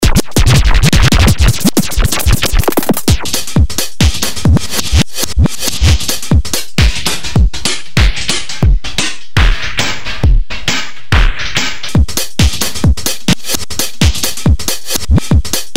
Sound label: scratch